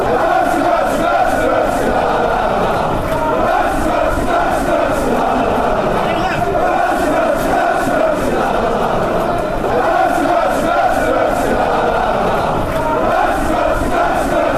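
bouncy bouncy bouncy bouncy lalala, football songs and soccer chants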